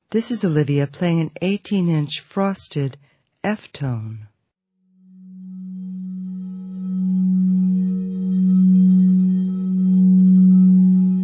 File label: f tone